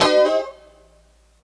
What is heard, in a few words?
D FUNK CHORDS, Guitars; Spectrasonics; Hans Zimmer Guitars VOL-1